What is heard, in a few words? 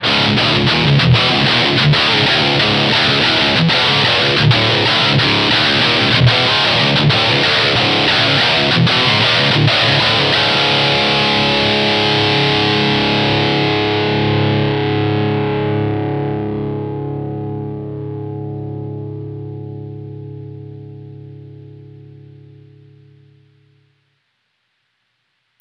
demonizer heavy; Damage Control